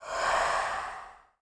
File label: Spade Guard Trumpy Idle